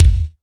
crisp kick one shot g sharp key, steel kick drum tuned to the G# note